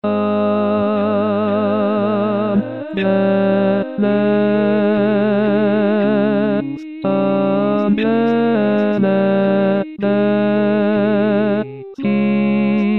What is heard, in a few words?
oboe tenor